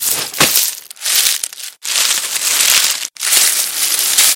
tradeskill foraging

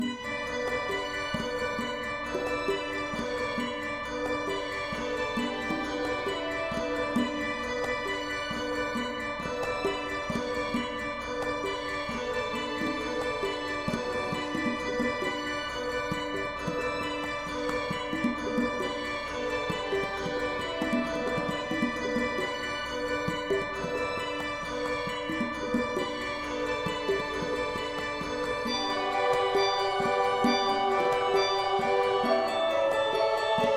wilderness calm fire

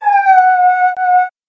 monster wolf

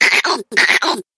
monster imp

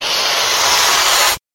monster blowfish

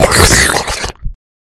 game enchanting merge

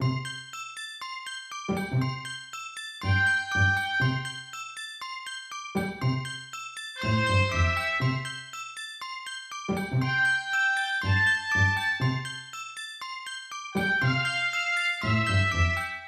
holiday christmas, music

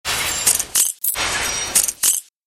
monster spider mage

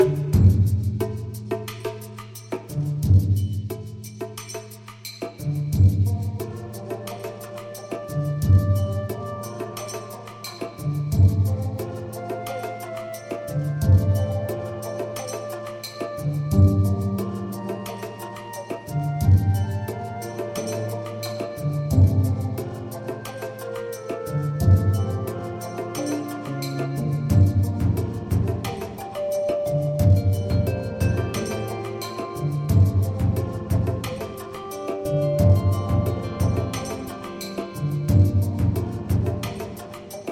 wilderness intense ice, music

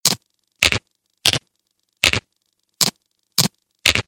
tradeskill harvesting

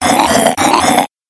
monster lizard

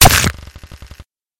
hit dagger

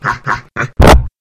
monster demon

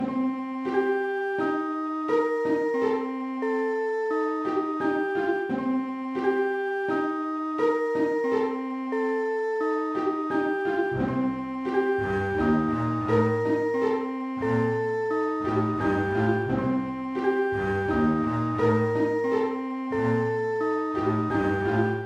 cave sneaky, music

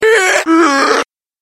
monster lizard man

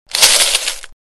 shop sell

